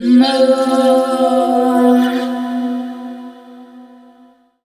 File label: VOICE FX, Dance Vocals